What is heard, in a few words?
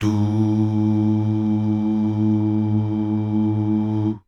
DUUH